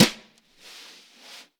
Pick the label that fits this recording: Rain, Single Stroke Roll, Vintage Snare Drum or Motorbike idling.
Vintage Snare Drum